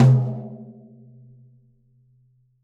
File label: Tom Yesteryear; Drums